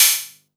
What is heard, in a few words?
Open Hats, Cymbals